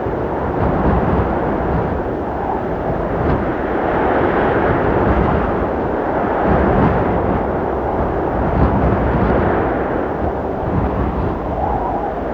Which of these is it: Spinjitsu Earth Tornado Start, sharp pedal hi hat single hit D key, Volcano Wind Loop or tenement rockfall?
Volcano Wind Loop